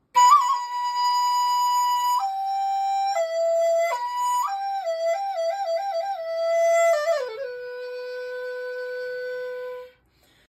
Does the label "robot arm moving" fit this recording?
No